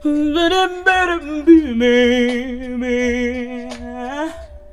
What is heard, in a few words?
FEMALE VOX, Hip Hop; E-MU Formula 4000 Series Vol. 1; Hip Hop Nation; Phatt Phemmes 4